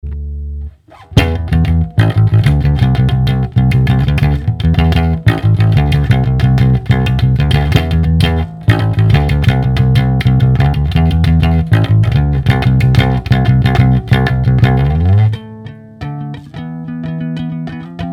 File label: bass